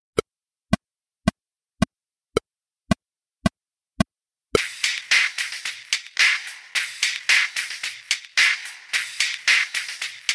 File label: Loop